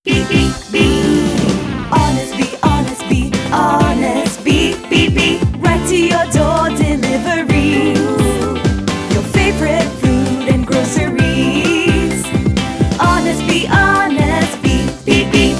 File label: Jingle ENG; 30 Secs; Honestbee